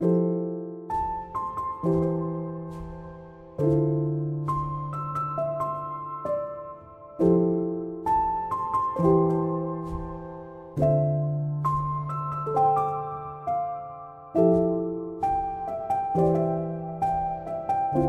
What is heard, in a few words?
Piano Instrumental